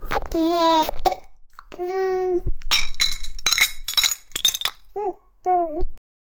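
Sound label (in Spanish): dos bebés bebiendo de bibe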